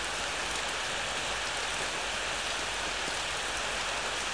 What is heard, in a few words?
rain